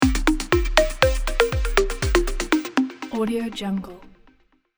audio logo

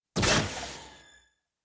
synth power bursts start up

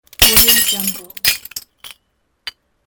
mirror

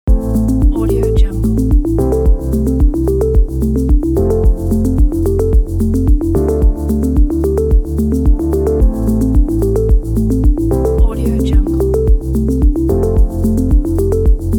chill tech

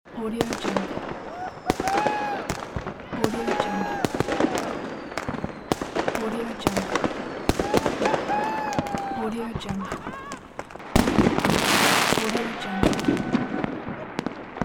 fireworks with people partying

